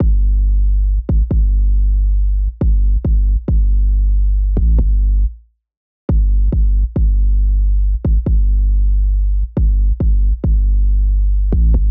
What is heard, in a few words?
Fm, 138BPM, 808 Bassline